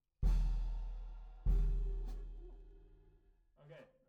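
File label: Snare Bottom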